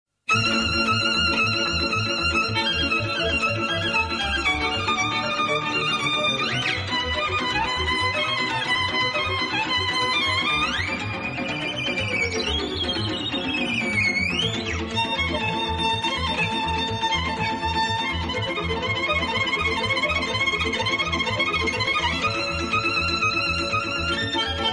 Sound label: violin